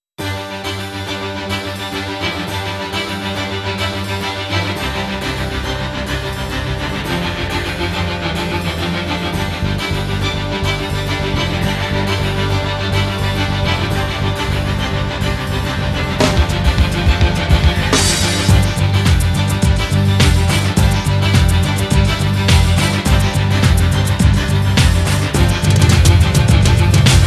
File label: Karaoke